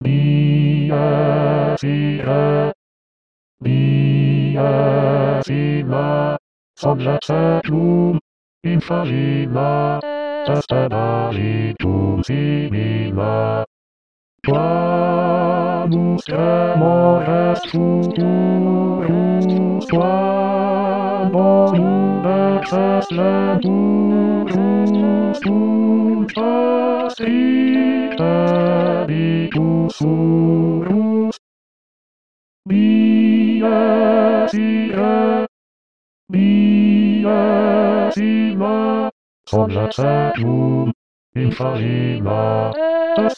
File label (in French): dies irae basses